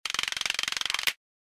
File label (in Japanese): クロスボウ溜め